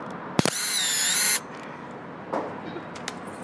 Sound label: the sound of an old camera